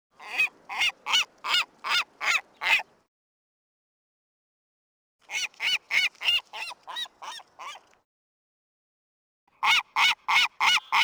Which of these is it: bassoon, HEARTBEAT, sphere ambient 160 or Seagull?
Seagull